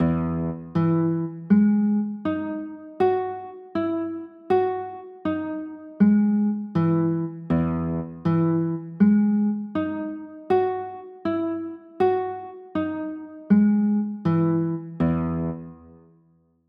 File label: e maj9; chords